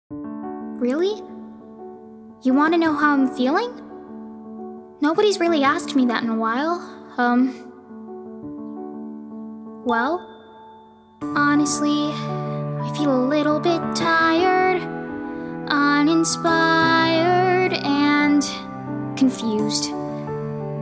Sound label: VOCAL; LASHAWN – is an honest, vulnerable kid. This role requires the ability to be emotional.